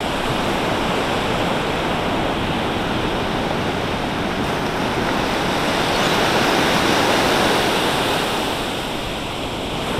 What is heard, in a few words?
Waves; Pet Rock; ambience pet rock